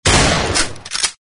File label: Player Shoot; Acid Rising